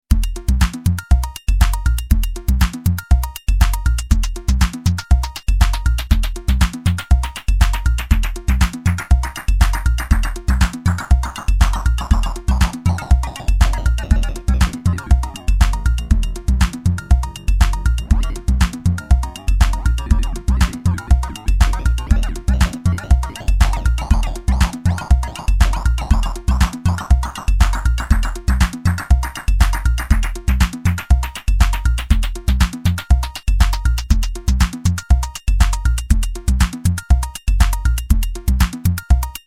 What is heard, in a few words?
korg volca sample groovebox